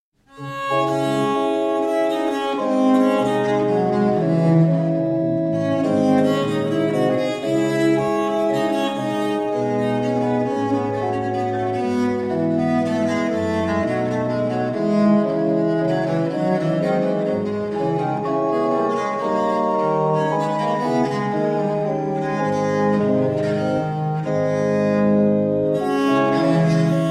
Courante Partie a Viola da Gamba solo com Cembalo D Minor; Theodor Schwartzkopff; Ernst Stolz; German Viola da Gamba Sonatas